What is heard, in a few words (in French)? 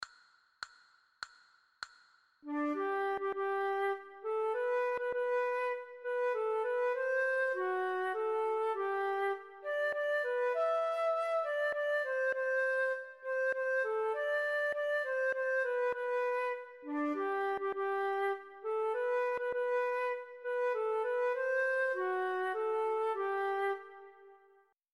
mon beau sapin, flute a bec